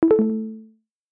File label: ping down